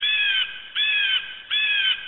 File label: blue jay; animals